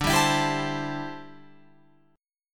D 7th Suspended 2nd Suspended 4th D, Guitar Open F; D7sus2sus4; strummed; 14,14,16,14,15,16